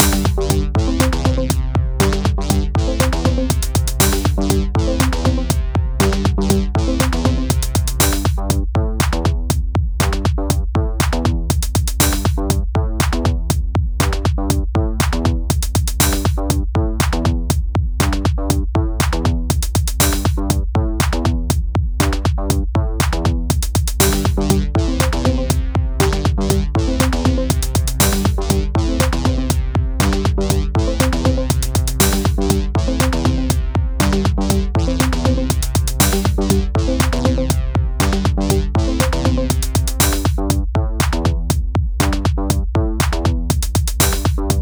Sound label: City Song Instrumentalversion; Liestal